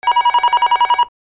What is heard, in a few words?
PHONES